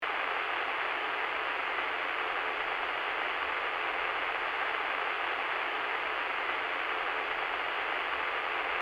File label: CB radios walkie talkie radio static loopable